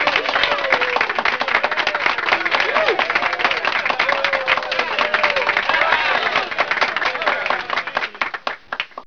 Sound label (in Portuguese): aplausos, sons humanos